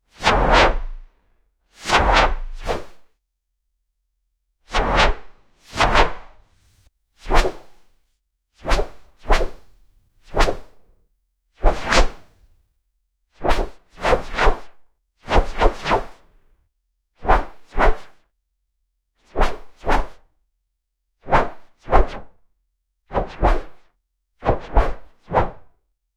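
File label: whooshes light paper, design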